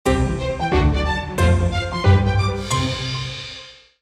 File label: positive orchestral jingle